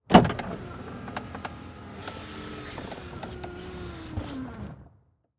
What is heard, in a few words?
Auto Saturn Close Window Exterior; Transportation